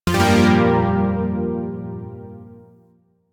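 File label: Shawzin Minor Chord; Zariman Shawzin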